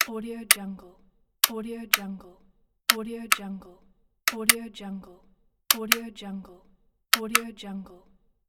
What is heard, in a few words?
lamp switch